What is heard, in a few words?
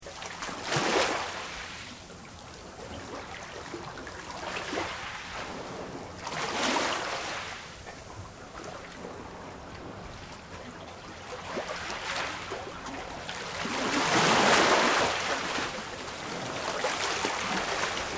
Water Ocean Small Beneath Pier; Elemental weather effects; Nature